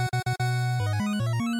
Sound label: NES RPG Victory Intro